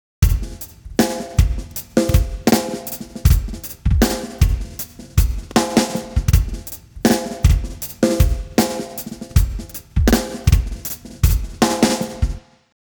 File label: drums 133; timestretch